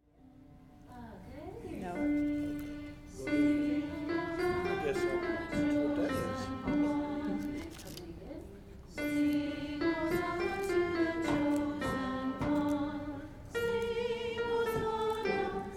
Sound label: alto; Palm Sunday Processional; Gather Hymnal